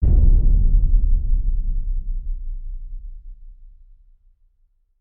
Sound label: Hit Lo Thunder; Brand X Music; Brand X Music Catalogue - Editor's Essentials